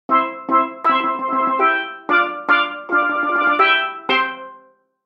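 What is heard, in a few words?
steel drums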